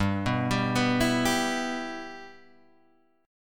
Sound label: G 6th